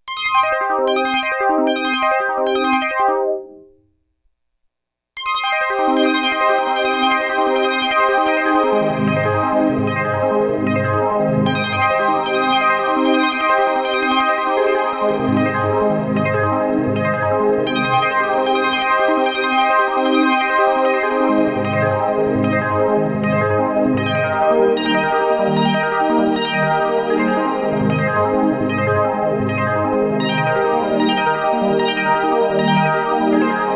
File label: cloud, roland juno 6; bigsky